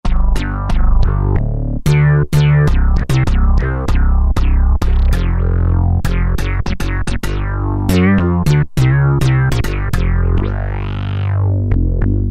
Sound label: Dignified Bass, Roland JD-990; Factory Patches